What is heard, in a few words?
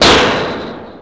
Clank, Industrial; One Shots; Drums; Misc; Sonic Foundry (Sony Creative Software)